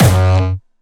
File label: Distortion Kicks Kick F#2; Bassdrums; Vengeance; Essential Clubsounds Vol.4; Bonus TonalHS Kick